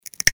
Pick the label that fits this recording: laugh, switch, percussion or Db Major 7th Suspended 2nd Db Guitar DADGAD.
switch